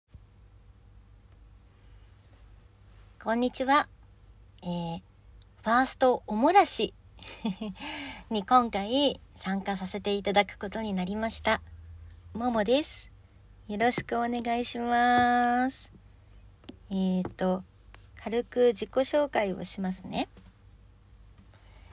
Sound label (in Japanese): 本物声優のリアルおしっこ, ジョバジョバ勢い オシッコをした後はそのままオナニーへ お風呂場中にびちょびちょ音が響き渡ります; 恥ずかしいから聞かないで...